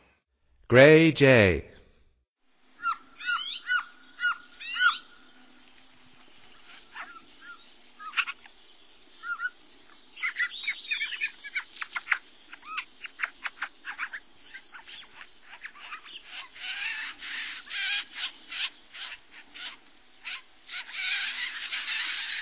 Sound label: Gray Jay, Songs of Birds of North America; Stokes